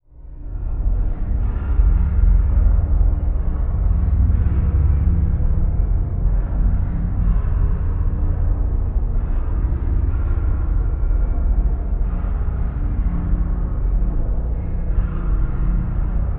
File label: Drone Vox Movement Reverb Slow Flange Low; Production Element Imaging; AdobeCC